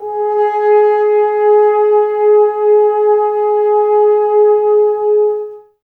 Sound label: G#, Brass, F.Horns, Woodwind Brass Ensemble; Sample CDs; Miroslav Vitous